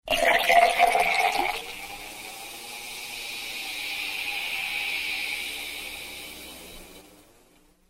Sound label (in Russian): nalivanie; noviygod